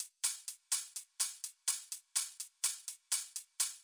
deep hihat, 125bpm

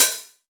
Open Hat Analog Mono

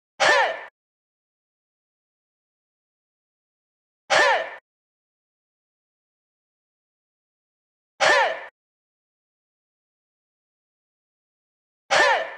Shout C Dry, 123

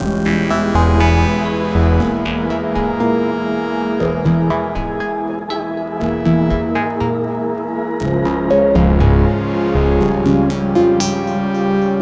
120 kit full loop cinematique Em

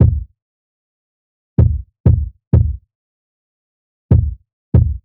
Kick Em, 95

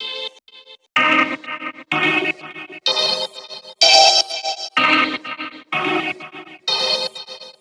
Music Loop Cm 126; Viaje A Lodz

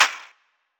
Fx Trap

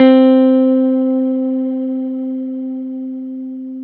Guitar Note Long C4; ChainPop

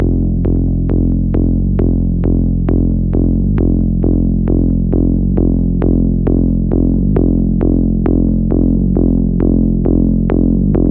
Synth 134BPM F Analog Saw

